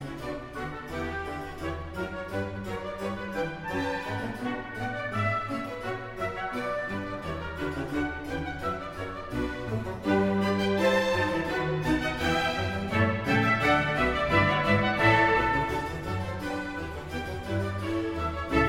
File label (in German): orchestersuite nr 3 d dur bwv 1068 gavotte; alternativement gavottte ii